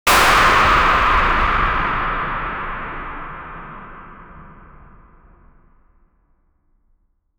stadium crowd noise with

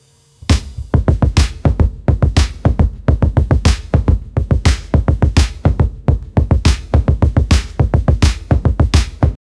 drum rocket music

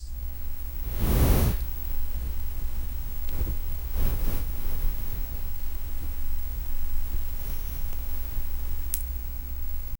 soft romantic asmr gentle; intimate

